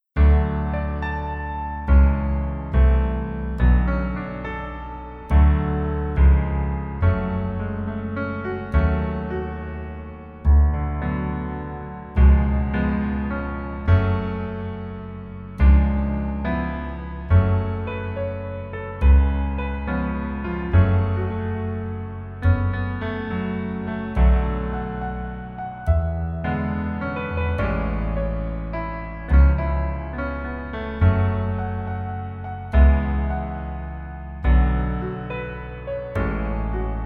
Piano ver 멜로디 MR 남자 키

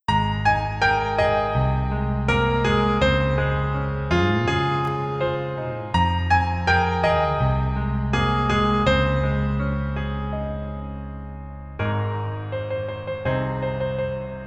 Piano ver, 멜로디MR, 여자키

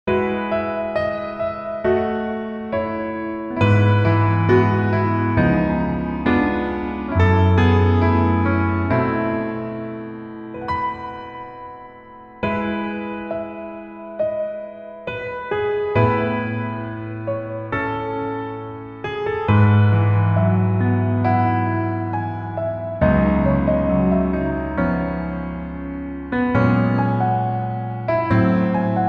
Piano ver 멜로디 MR -1 키

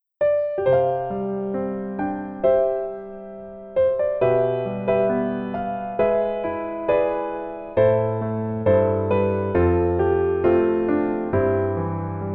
Piano ver 멜로디 MR

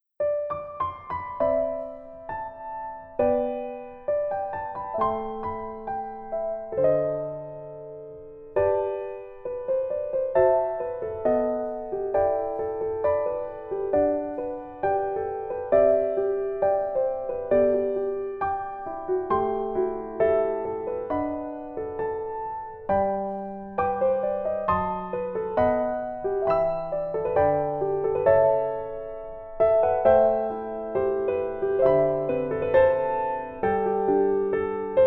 Piano ver, 멜로디MR, 여자키

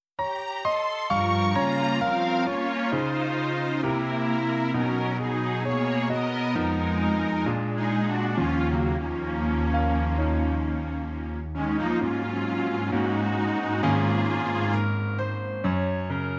Piano Ver 멜로디MR -1키

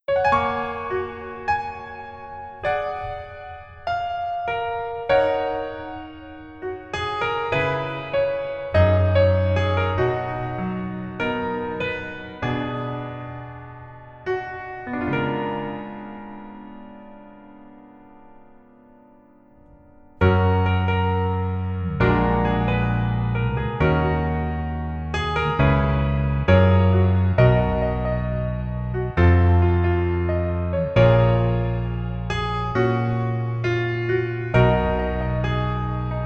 Piano ver 멜로디 MR 여자 키

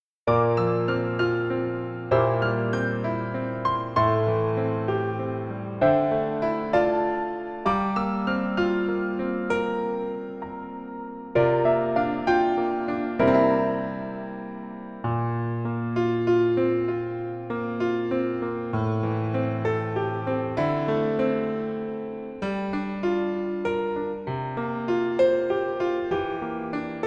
Piano ver 여자키

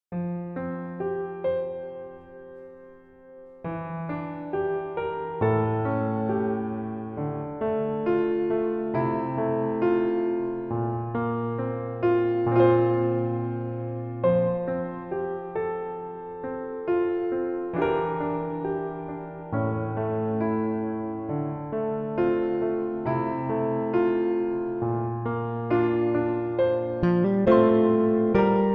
Piano ver 여자키

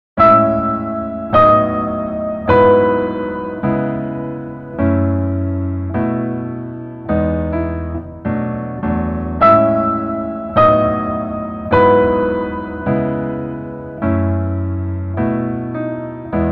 Piano ver, 멜로디MR

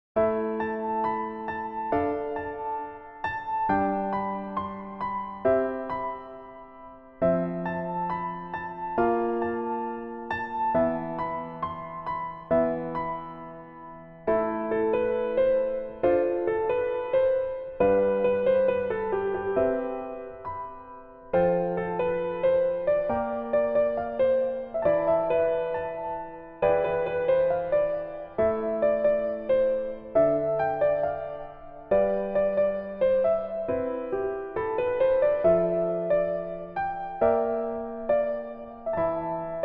Piano ver 멜로디MR; 복면가왕

